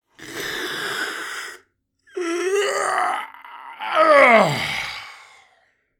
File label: Senior male yawning and stretching